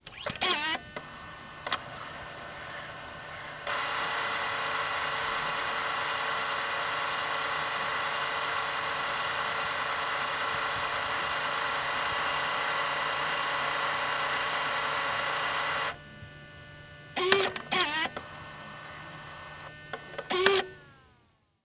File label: Technology Electronic Camcorder Panasonic Dvx100 Fast Forwarding The Tape